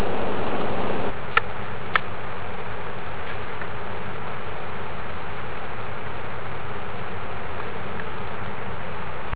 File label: with bass